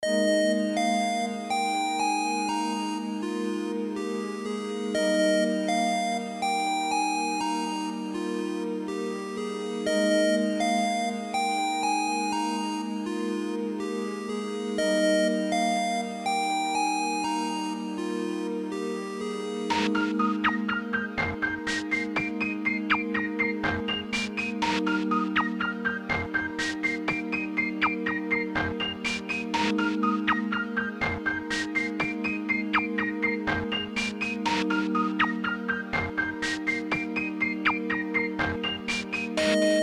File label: music unused lab; Alphys